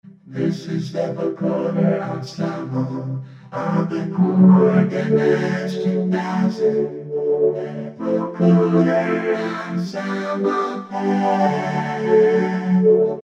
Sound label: Vocoder Ens, Korg MS2000; Factory Patches